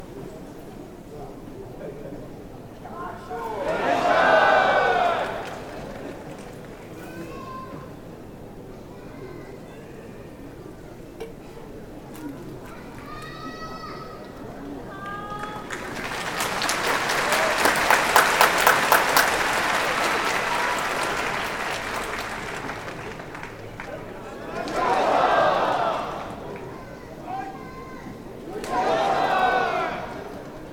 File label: Yokozuna enter